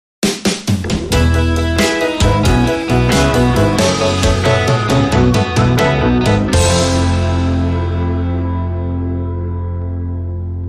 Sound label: Shuffle Down 50s Style